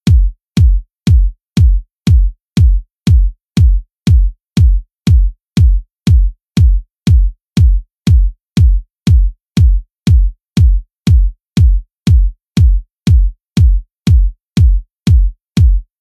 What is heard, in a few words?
Synth Kick Only